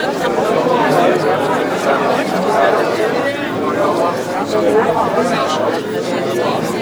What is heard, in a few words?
ATMO, 160 BPM, Drum & Bass; Ueberschall; Drum & Bass Flash Trackz